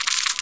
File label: roach attack; Army Men TiS